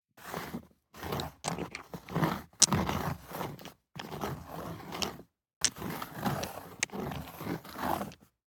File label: DICE on Neoprene, Slide, Standard, Two Dice; Samples; ArticulatedSounds; DICE Sfx Party (Extended)